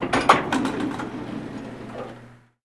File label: open, doors; bms objects